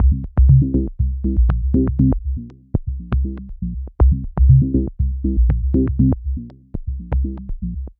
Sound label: Bassline, Prototechno